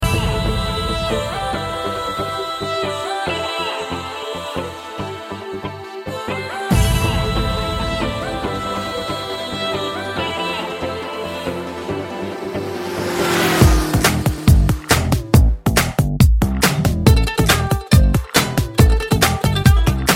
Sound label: Jazz